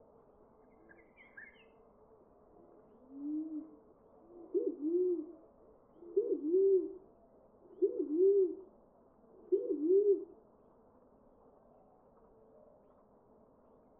Columbina inca

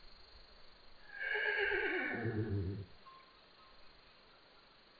Equus caballus

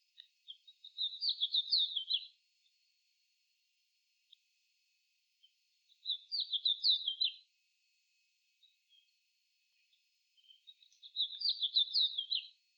Haemorhus mexicanus

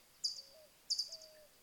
Melozone crissalis

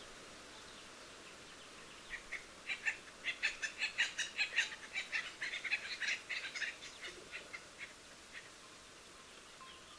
Melanerpes formicivorus angustifrons

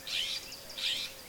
Aphelocoma californica

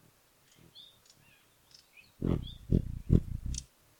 Basilinna xantussi

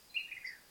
Myiarchus cinerascens song

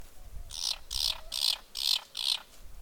Campylorrincus brunicapillus matraca

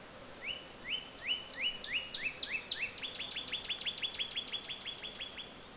Cardinal cardinalis

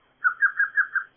Micrathene whitneyi song

